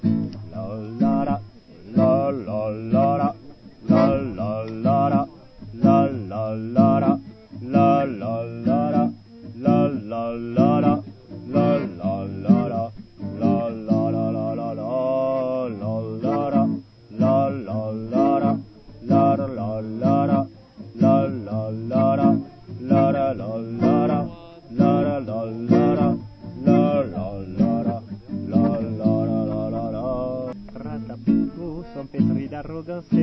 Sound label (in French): ajout rythmique basse